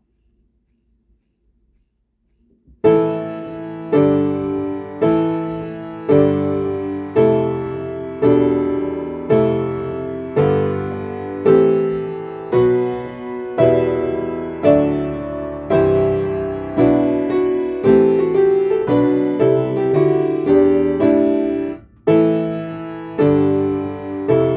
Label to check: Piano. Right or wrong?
Right